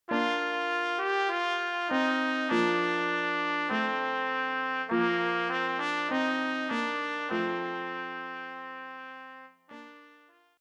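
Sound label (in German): Alle Jahre wieder Trompete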